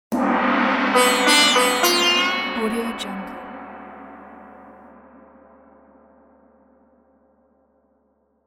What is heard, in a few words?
sitar gong transition